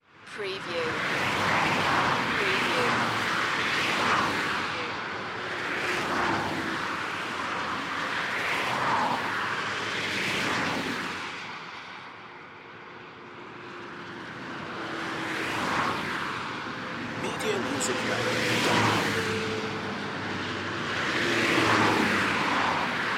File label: Motorway or Highway Traffic